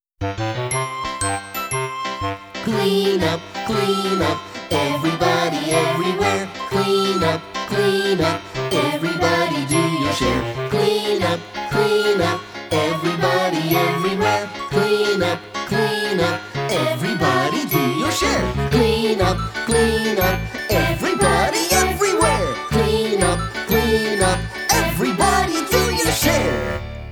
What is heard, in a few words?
Childrens Songs